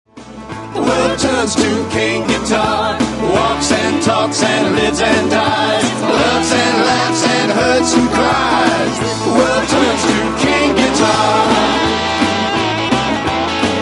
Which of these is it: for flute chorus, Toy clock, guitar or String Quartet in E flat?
guitar